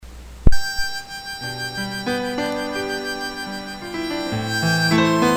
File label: harmonica